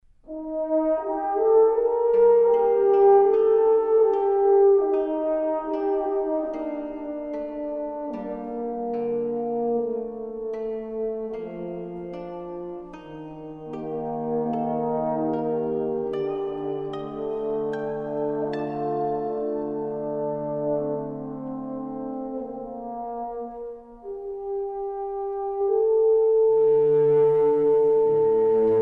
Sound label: Music